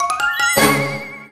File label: lv up; type4; Yo-Kai Watch; 3DS